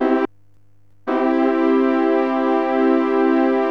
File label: MAJOR, SYNTH